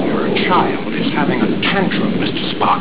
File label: tantrum